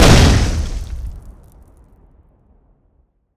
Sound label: Grenade Blast; zps; Weapons; Explosives